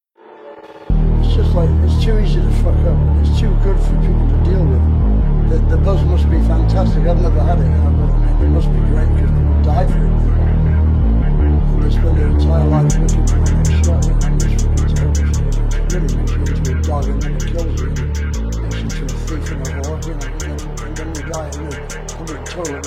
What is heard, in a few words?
Phonk